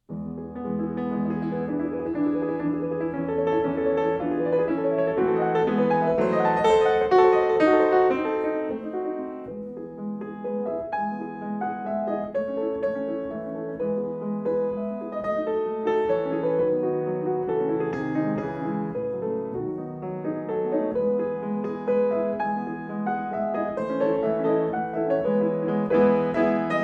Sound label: Poets Harp, piano; Mendelssohn; Op.38 No.3